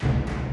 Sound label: Music Fight Vikings Theme Easy Start; asterix obelix slap them all 2